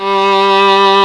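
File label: SOLO STRING G1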